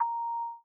Panorama Start; Microsoft Surface-Duo

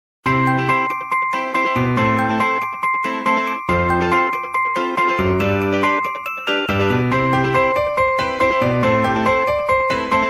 Dash, ringtones

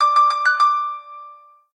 Jingle, ringtones; LG Optimus L9 P769

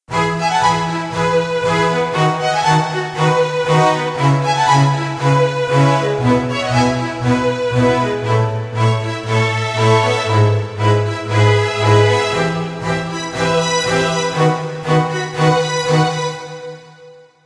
March

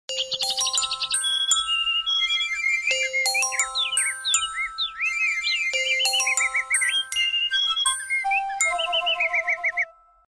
Birds Flute, ringtones; Walton Primo-NF2-Plus

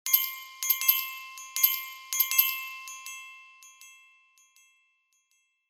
Dashing Calendar, Sleigh Bells; HTC

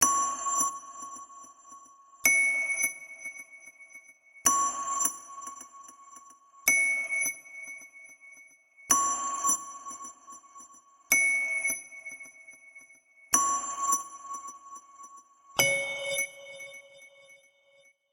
ringer zen, ringtones; Mozilla Boot2Gecko

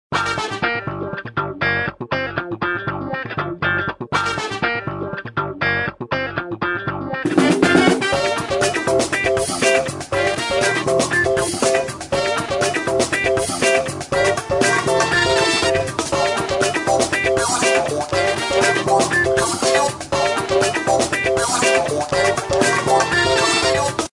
midi ring

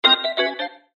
Organ, notifications; HTC Magic

